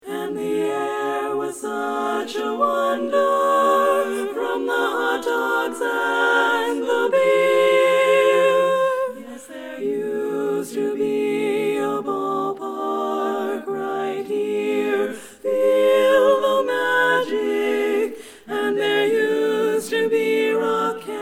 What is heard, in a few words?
Tenor